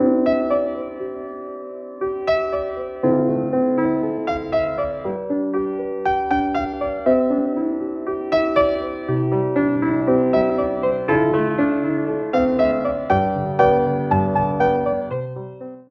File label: One Horse Open Sleigh